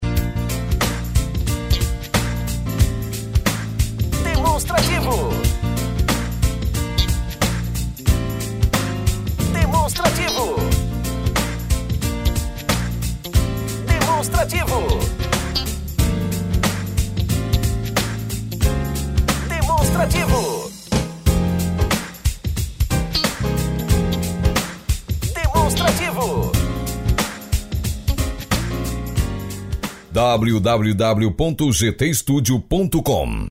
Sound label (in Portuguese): tema espera